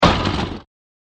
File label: Small Impact With Various Elements; Blasts; Impacts; Crafted Signals